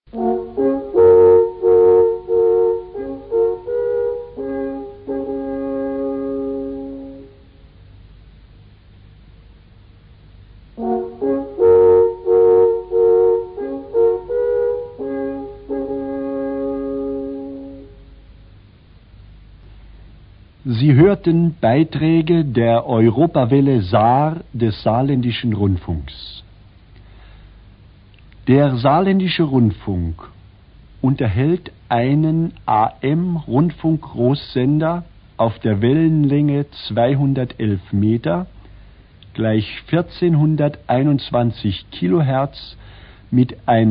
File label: interval signal; sr1 europawelle c1975